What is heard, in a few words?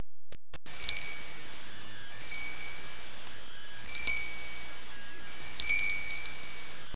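church bell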